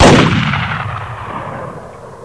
deagle, weapons

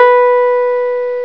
synthe; de rats theatre

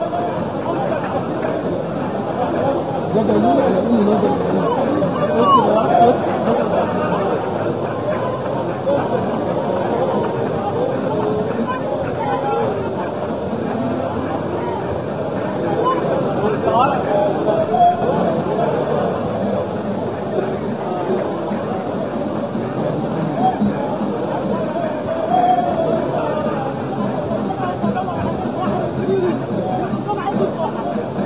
arab market

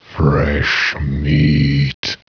zombie; de compclub

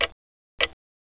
clock

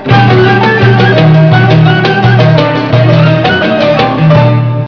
music start

